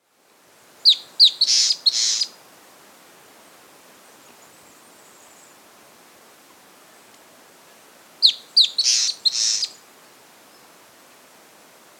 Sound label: Long-Tailed Antbird (Drymophila Caudata), Andean Birds; S. America